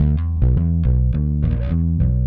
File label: Clinical Bass; Sonic Foundry (Sony Creative Software); Indie Rock Anthems; Session 02 - Clinical